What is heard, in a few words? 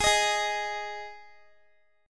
Ab 5th down Guitar; strummed; JGuitar